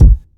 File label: low tone kick one shot F sharp key; kick drum; kick drum samples